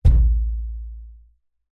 impact metal hollow thump side washing machine

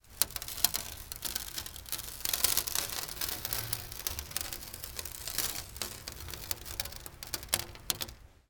foley sand pour on glass; martinimeniscus

